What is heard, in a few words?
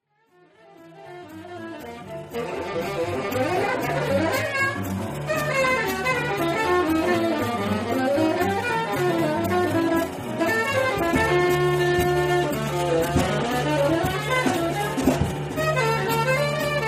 Tenor